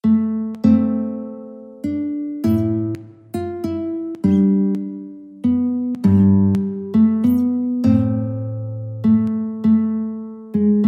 guitar

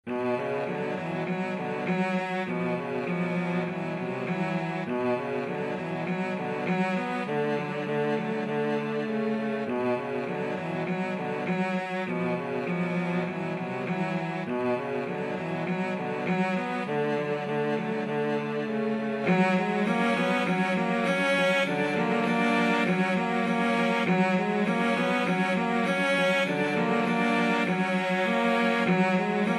mountain king, cello trio; grieg

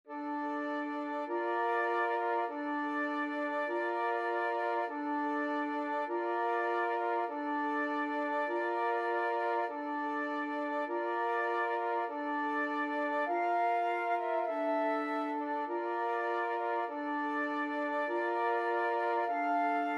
flute quartet

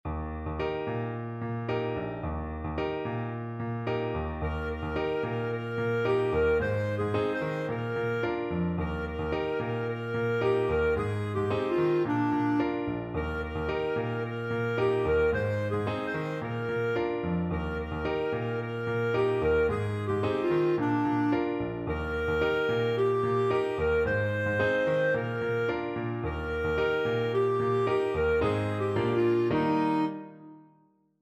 kokoleoko, clarinet

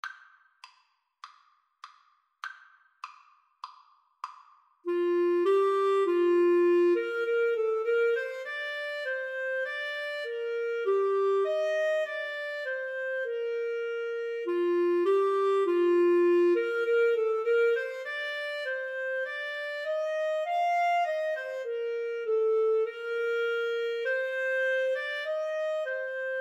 Clarinet Duet

